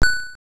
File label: Credit SFX; The Amazing Adventures of Mr. F. Lea; arcade